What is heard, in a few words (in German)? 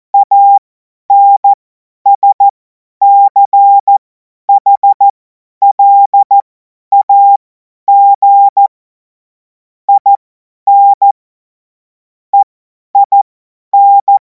Witz, Morsekurs, cw morsen; Tempo 50 F70; hamradio; cw witze